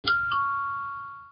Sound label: doorbell